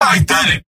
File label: scout cheers; mvm